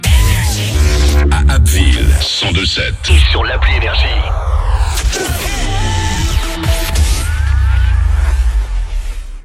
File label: JINGLE